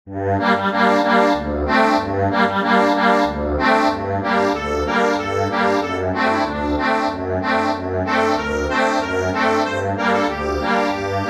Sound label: accordion, sheet music